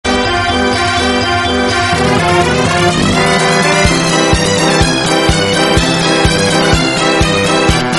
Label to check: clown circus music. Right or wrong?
Right